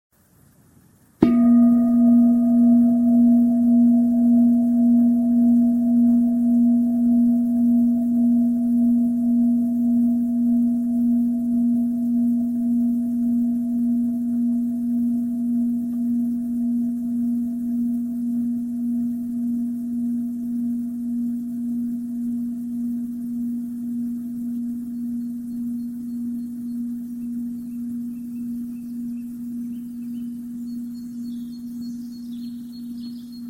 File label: vipassana bird song; Випассана 1 вариант – с именованием. Пение птиц. 28 мин.